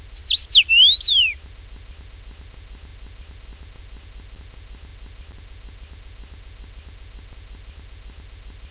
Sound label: Carpodacus erythrinus